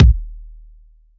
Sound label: punchy steel kick drum b key, Royality free kick sample tuned to the B note